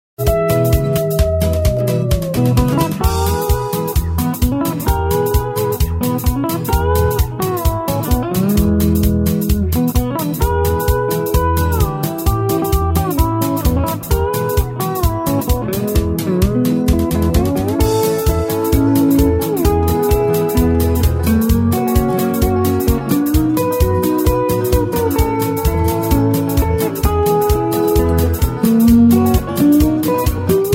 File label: Country Covers